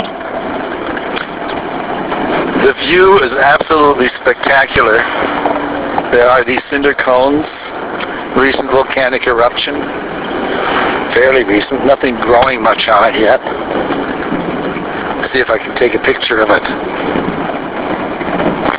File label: Mauna Kea cinder cones; star city